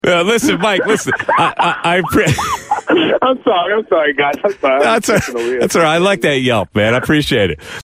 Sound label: laugh; Mike Tyson